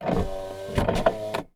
Robo Servo Power Window; TheLibrarybyEmptySea; Robobiotics; TheLibrarybyMTC

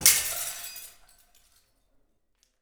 Glass Smash; 99Sounds; The Warehouse

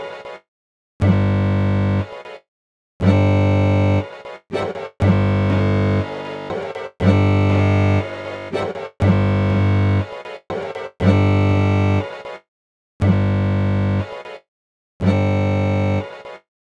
Loop F# 120 bpm; 99Sounds; Mangling Audio; Mangled Software; Midiception

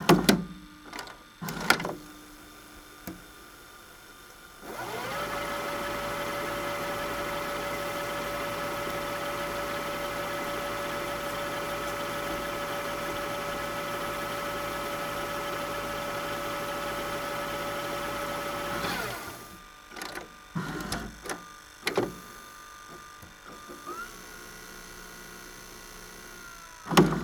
VHS Tape Rewind; Sonic Bat; The VHS & Betamax Sessions

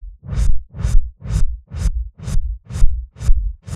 Loop 128 bpm, Stretchmangled; 99Sounds; Mangling Audio

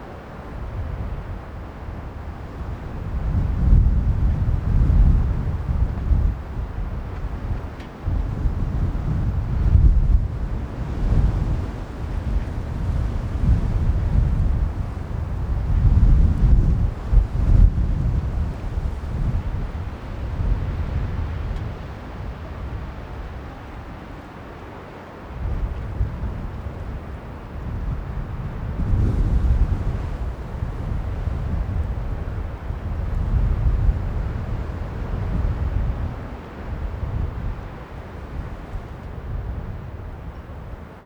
wind blowing; Borys Peter; Blowing winds

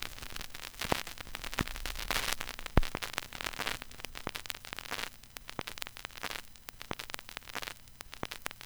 vinyl crackle; 99Sounds; Vinyl Noise SFX

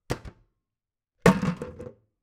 Plastic bucket kick; Matt Sherman; Gift Of Junk v1

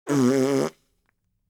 Raspberry Long; BBC Sound Effects Library

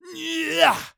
Male Grunt Shout; SoundBits; Screams & Shouts 2 - Humans

